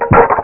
object falling; Columns ][ 1.1

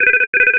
Beep

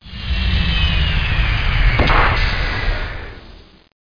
landing; Dark Forces Demo

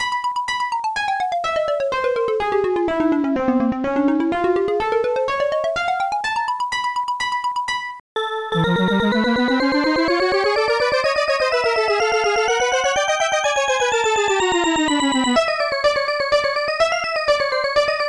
Scale; Digital Symphony Collection

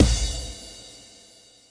break

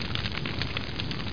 torch; dosgames; roc

